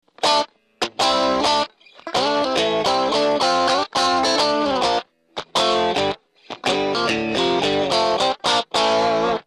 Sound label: cable